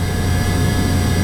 whine, ships; CosmicRage

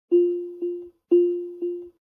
seatbelt warn, vehicle

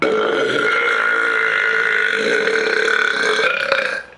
belch

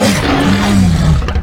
dragon roar; general combat; dragons

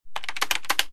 keyboard, ships computer

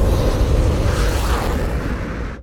move, combat suit

